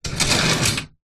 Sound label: office metal wire tray drawer slide